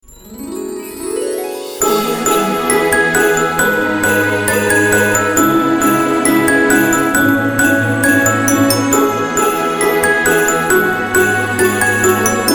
christmas orchestral logo; pumpkin-music

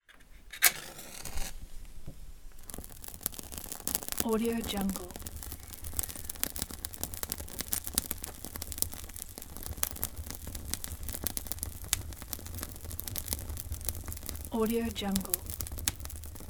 ignition of fire

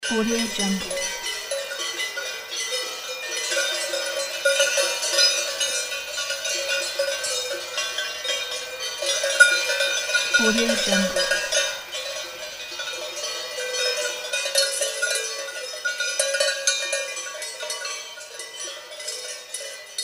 swiss alp cowbells pack

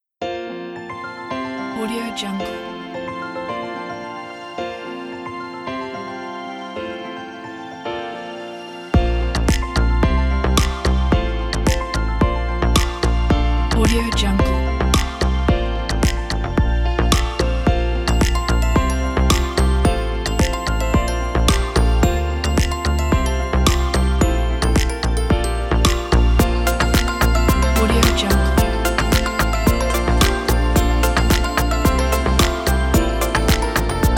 motivational uplifting inspirational bells

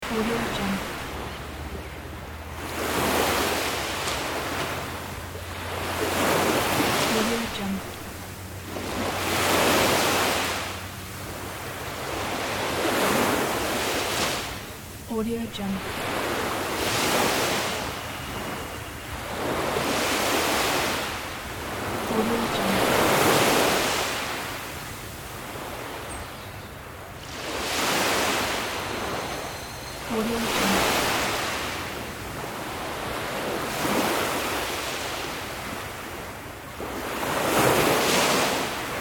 sea waves; ozgurmmp

